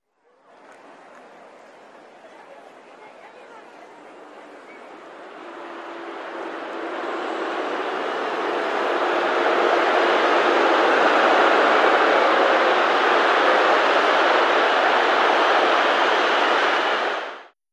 Crowd Reaction, large

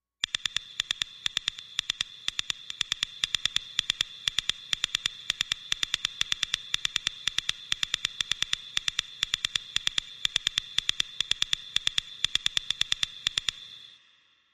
Busy Crix Machine Tele, radio

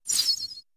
Arrow Whistle Bys, bow & arrow

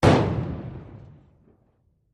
4 Oz Concussion Hit M

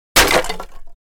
Single Piece Wood Fal

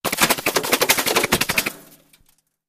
Bullet Impacts Metal

